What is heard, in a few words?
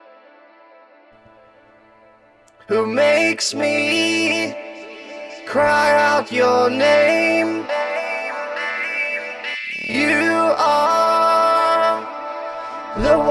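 vocals loop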